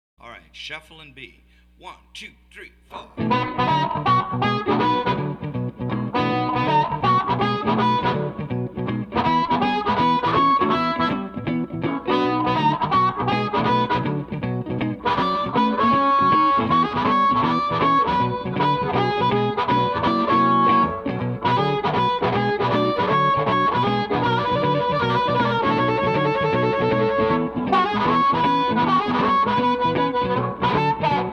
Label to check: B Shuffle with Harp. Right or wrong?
Right